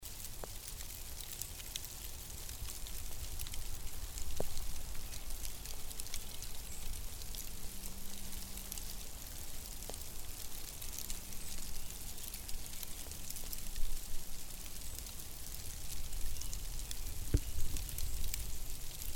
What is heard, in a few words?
beech tree grauple